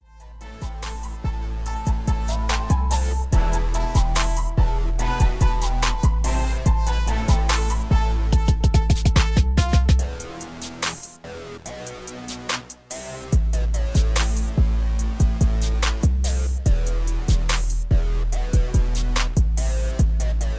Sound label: 72BPM